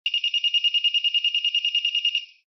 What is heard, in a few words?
metal detected